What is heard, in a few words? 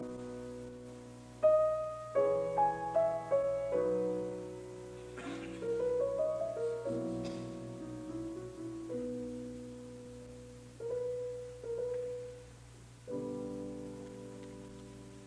Piano Concerto in C minor Largo; Beethoven; Op 37